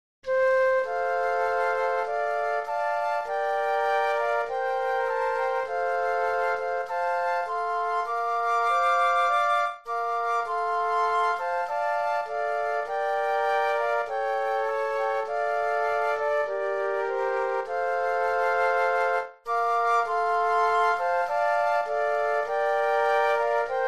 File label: Flute Trio; christmas